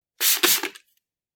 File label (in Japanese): 霧吹きの音